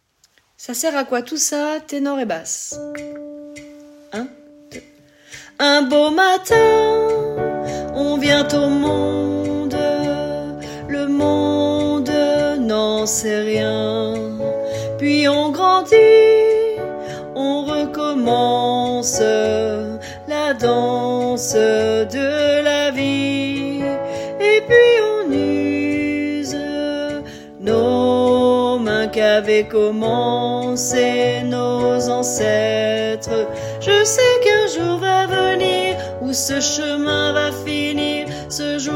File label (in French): tenors basses